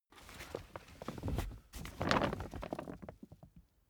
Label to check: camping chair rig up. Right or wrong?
Right